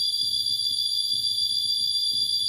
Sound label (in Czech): DVERE ALARM